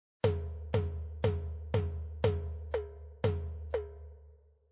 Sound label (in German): Rhythmus